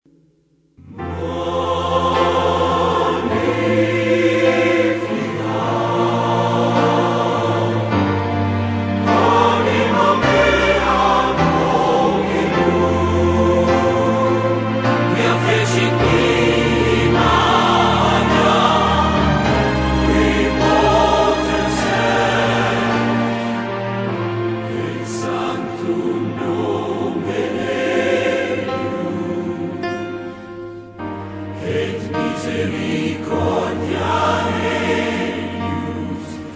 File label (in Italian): Magnificat coro